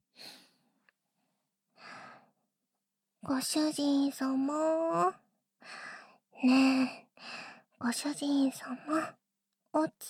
ご挨拶耳舐めキス手コキ！（効果音無し）; 猫耳オナホペット三姉妹を買える未来がやって来た!; ダチュラスクリプト; キャットシスターズ

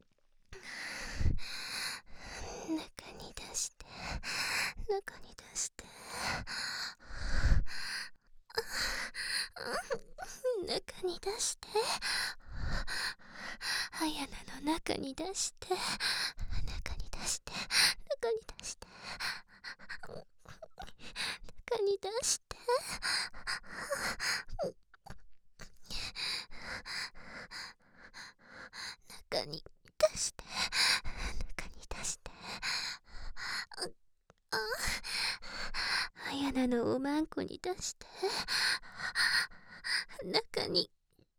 中に出して 喘ぎ; DL製作班; ハーレムオナサポ 4人のメイドがご主人様のオナニーをお手伝いするバイノーラル

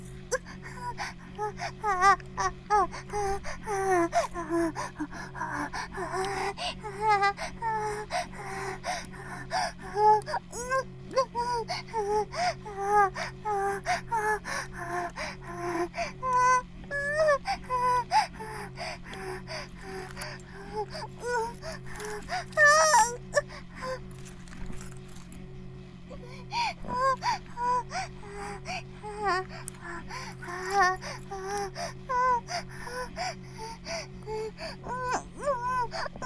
バイブ放置, ASMR

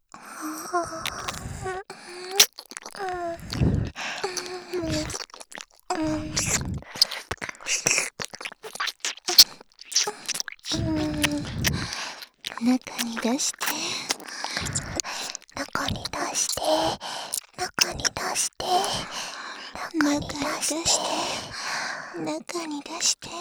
耳舐め; ハーレムオナサポ 4人のメイドがご主人様のオナニーをお手伝いするバイノーラル～文、千曜、名月、莉桜～

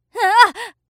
ダメージ うあぁっ, 戦闘; 戦闘 11

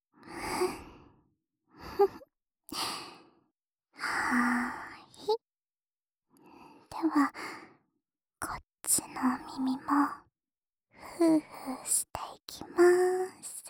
右耳ふーふー, ASMR; 今度はこっちのお耳を……♪

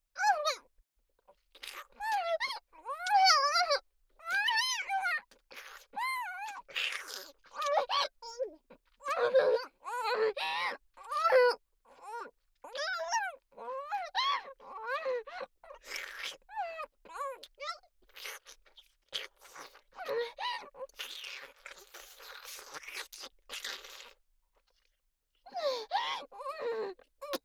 イラマチオ・恐怖に泣きながら激しくフェラをする声; 生意気少女系カノジョ

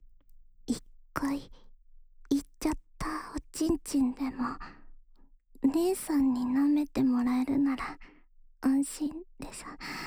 深い耳舐め（左耳）と、フェラチオ, ASMR; シアの深い耳舐め（左耳）と、メアのフェラチオご奉仕

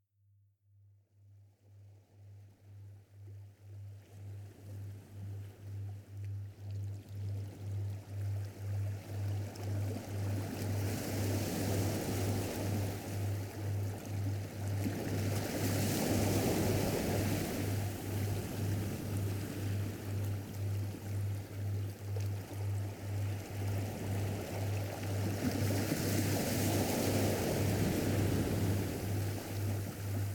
delta波

